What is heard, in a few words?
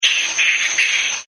BATS; Partners In Rhyme